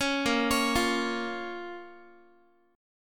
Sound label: Bb Minor Bb down Guitar; arpeggiated; JGuitar